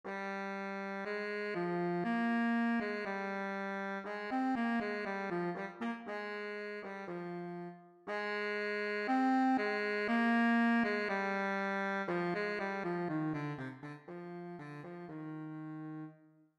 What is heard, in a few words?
bari sax etude